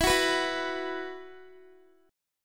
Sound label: E Suspended 2nd, Guitar; Esus2; strummed; x,19,18,16,18,x